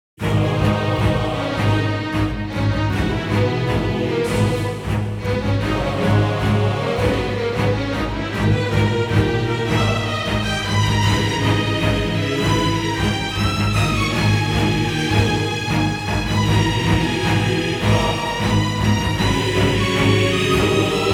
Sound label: Boss Fight